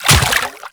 Step Water, Footsteps

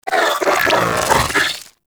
Vocal Idle; Warframe; Moa Satyr

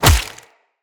Melee Fist Impact Flesh

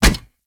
Kubrow Run Metal; Warframe

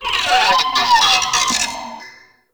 Energy Scream; Ambience; Warframe; Corpus Railjack

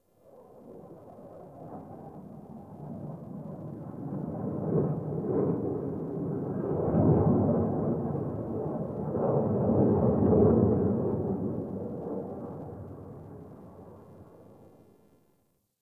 Distant Ambient Fighter Jet; Warframe; Nineteen Ninety Nine

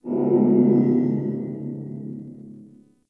Galleon Low Ship Amb; Ambience; Warframe; Grineer Galleon

